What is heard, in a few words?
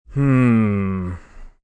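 Hmm Pensive male, Reaction, male; Exclamation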